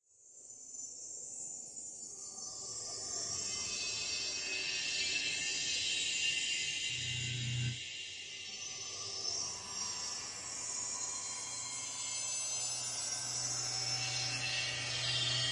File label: Drones; Gothic Storm Music; Advanced Sound Design 2012